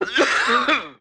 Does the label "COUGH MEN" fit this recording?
Yes